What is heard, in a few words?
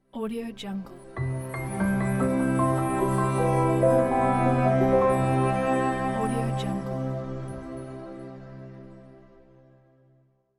classic logo; suricateband